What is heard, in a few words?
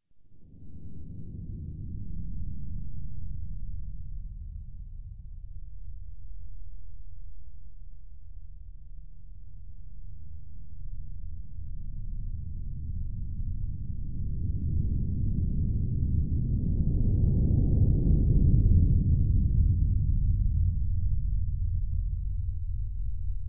Distant Thunder